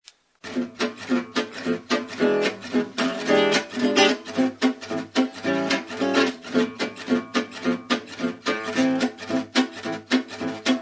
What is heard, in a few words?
Rhythm